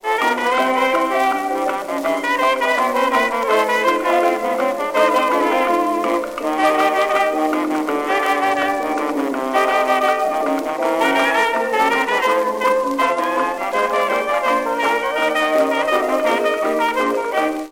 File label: jukebox blues